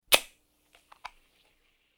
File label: soda can opening